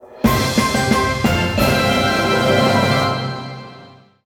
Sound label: World Open